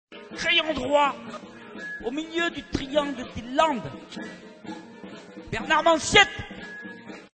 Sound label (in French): Jingle; Au Milieu Du Triangle Des Landes; RadioUz